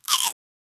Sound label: chewing sound; eating